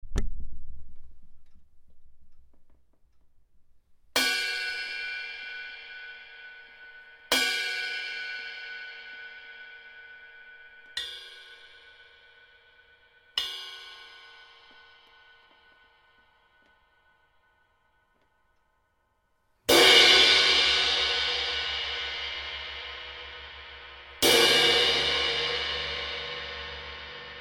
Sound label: Classic Ride Jazz 19; Amedia